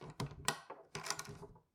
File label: Wooden Chest Open